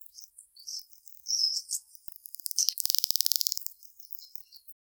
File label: sticky honey dripping; insects